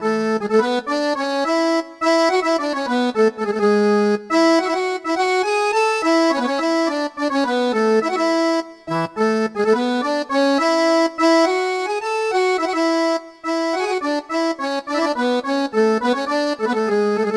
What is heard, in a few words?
Town Tavern